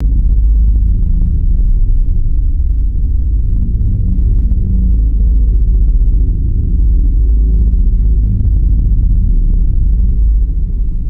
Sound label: deep throbbing sci fi synthetic drone; magic scifi